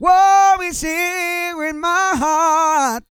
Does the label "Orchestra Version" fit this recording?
No